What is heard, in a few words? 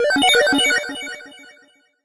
Board Appear Chest; Pirates Of Treasure Island